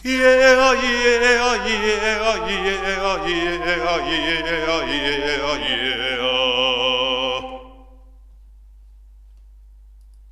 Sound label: Vocalise